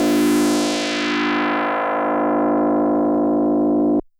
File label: DX100 D4; rocket